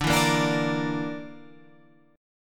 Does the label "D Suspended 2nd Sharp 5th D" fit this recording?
Yes